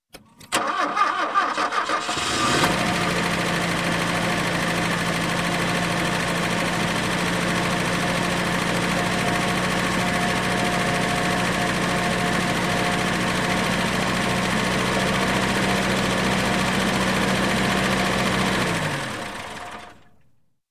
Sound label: Alfa Romeo Sports Car; Sound Ideas; Series 2000